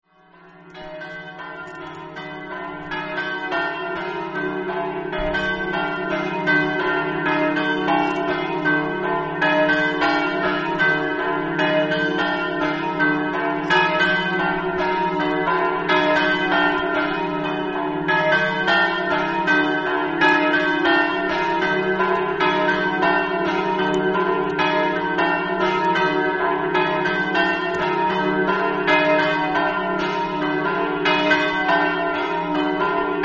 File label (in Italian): nespoedo, campane